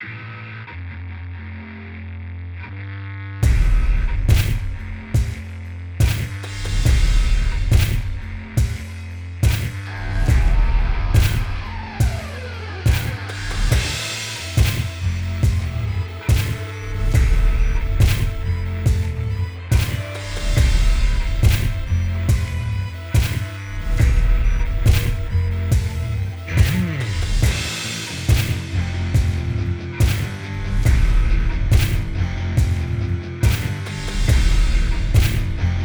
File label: industrial rock; Jamie Coleman, Film Composer